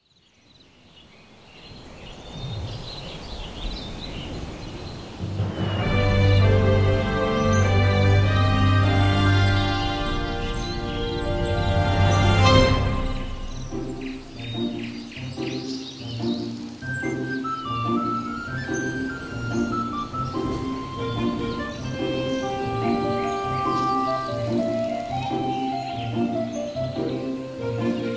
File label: Soundscape Version; Kokiri Forest; The Legend of Zelda Ocarina of Time